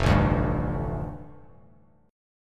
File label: F 6th Add 9th, Bass Standard, F6add9 chord; strummed; 1,0,0,0; JGuitar